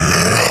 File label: pain; css; kfsounds